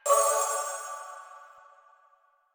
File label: Choir Bow Charge Full; Warframe; Weapons